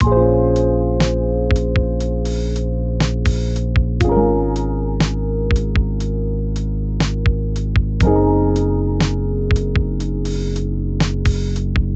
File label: Lo-Fi Sunday Drive